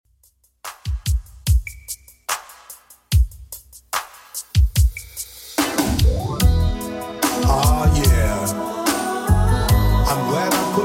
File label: 2ks Redrum 73bpm